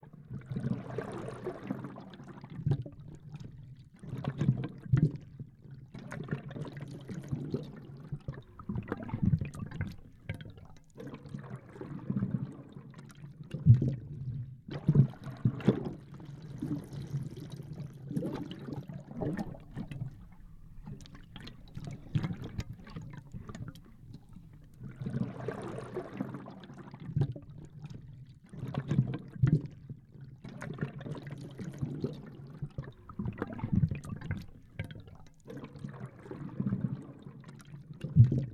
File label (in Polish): podklad podwodny